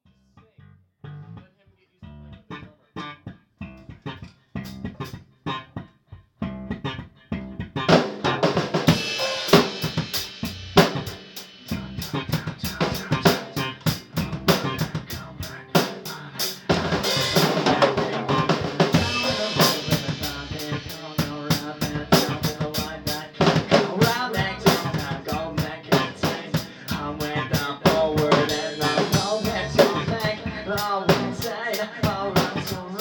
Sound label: Broken Funk